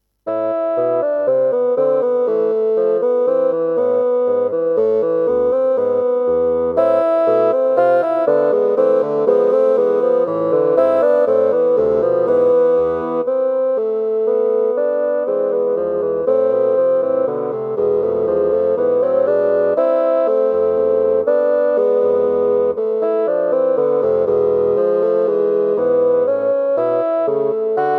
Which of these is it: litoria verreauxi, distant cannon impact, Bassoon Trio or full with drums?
Bassoon Trio